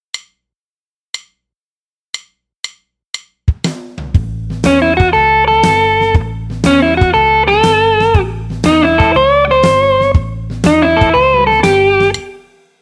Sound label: Build Up